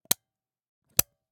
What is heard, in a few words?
Camera, Pentax KS2, DSLR, mechanical, lens cap, remove, replace, XY; Eiravaein Works; Vaeyan IV